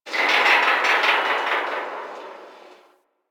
Landscape Construction Site One Off, Ambience

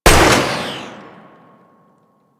Mech, Weapons; Strun Vandal

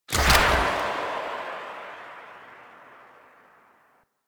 Skiff Engine Boost Slow Stop, Vehicles; Warframe; Grineer Skiff Player Vehicle

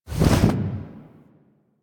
Exit Aim Glide; Warframe; Tenno

